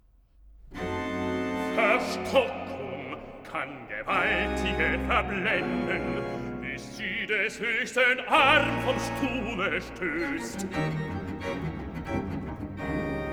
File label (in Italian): Recitativo (Bass); BWV 147 'Herz und Mund und Tat und Leben'; Part 1, IV. Recitativo (Bass) 'Verstockung k; Bach; Lüneburg; 4th Sun in Advent; Cantata